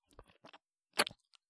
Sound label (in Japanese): ちゅぽん, ASMR